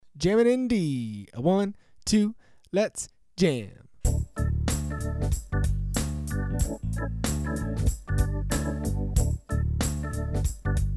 D Jam Track, piano

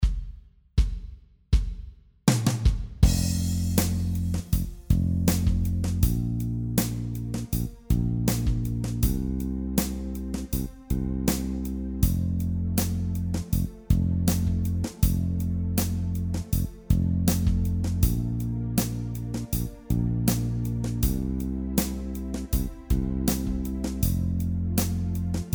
F Groove Jam Track

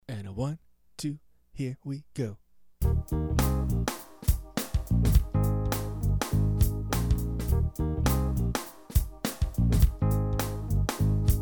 Eb Jam Track

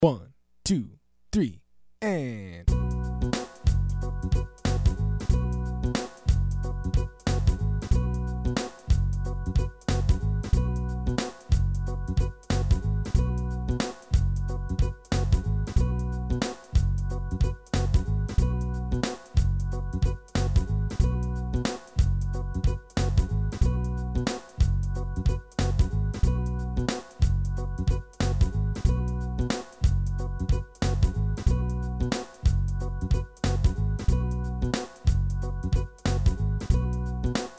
B Jam Track, piano

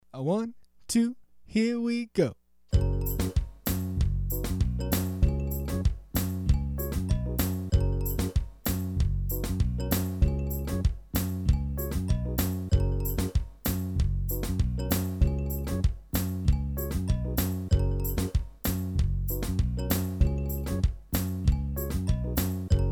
F Gb Jam Track, piano

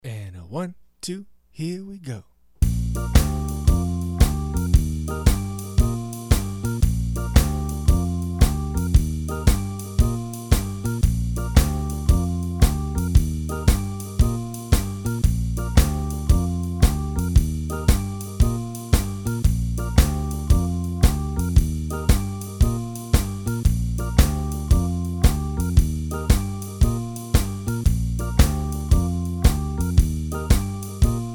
Bb Jam Track, piano

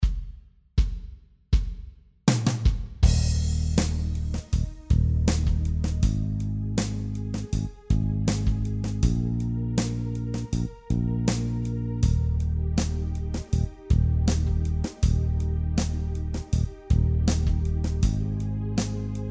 Eb Groove Jam Track

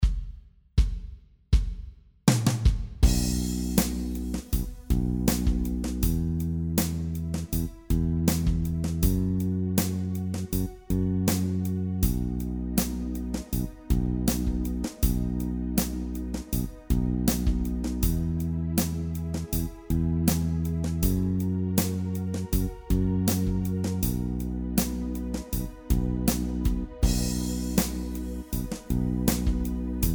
B Groove Jam Track

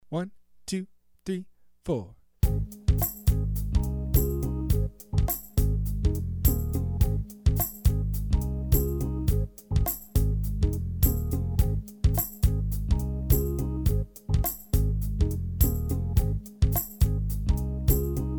Ab Jam Track, piano

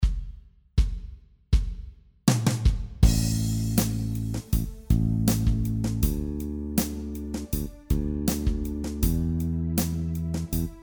Groove Jam Track

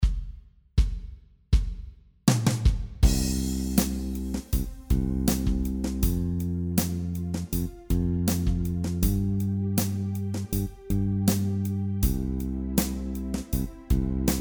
C Groove Jam Track

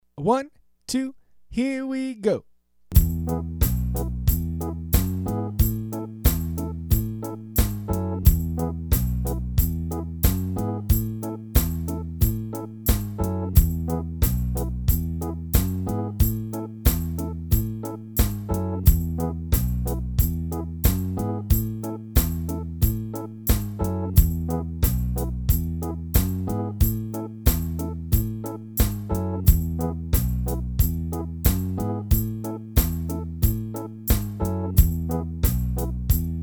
E Jam Track, piano

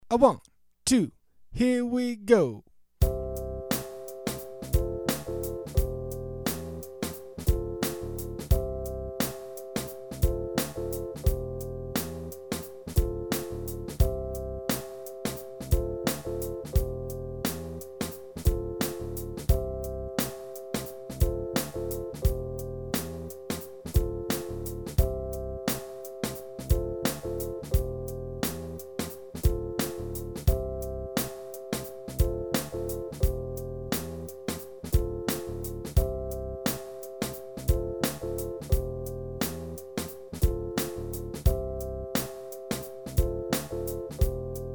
C Jam Track, piano

